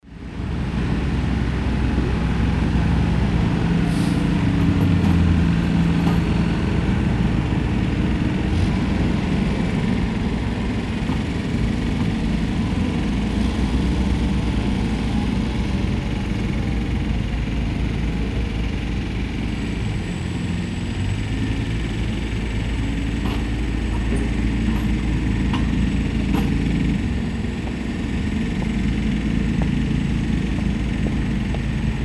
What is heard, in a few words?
road work machine noises